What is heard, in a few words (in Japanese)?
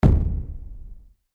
太鼓 ドン